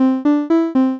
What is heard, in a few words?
just melody